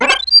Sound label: item fairy; zelda